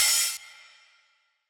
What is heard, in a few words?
Open hat, retro house samples, Drum Hits